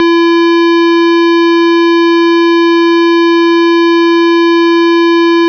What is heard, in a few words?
tone